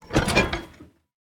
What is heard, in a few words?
copper chest open